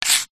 office chalk stick thick draw line street pavement